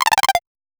a pixelated 8 bit style s

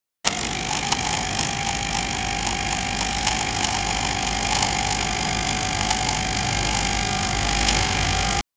sound of an arcade claw

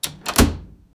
automatic door opening

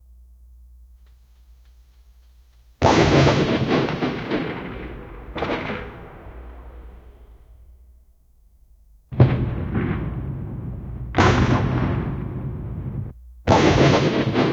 machine gun fire in the, automatic fire

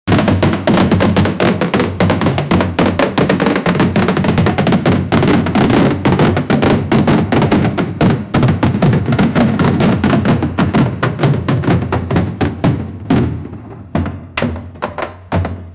a marching band falling d

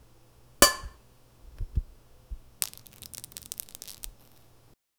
plop of food plate clink, dining

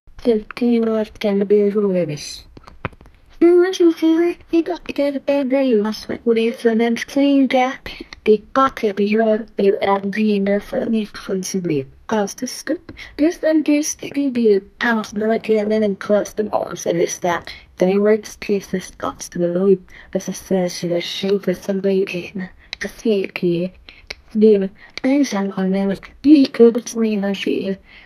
welcome for a kids app

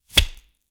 bad katana cutting bamboo

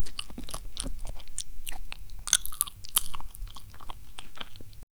asmr close up sound of; chewing

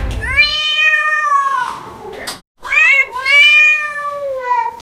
a cat playing sports in

